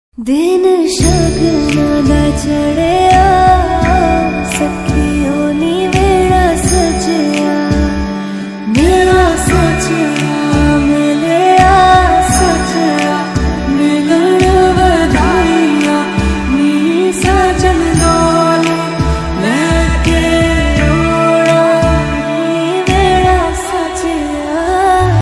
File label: panjabi ringtone